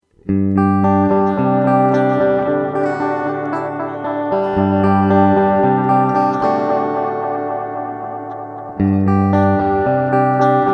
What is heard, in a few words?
Reverb Style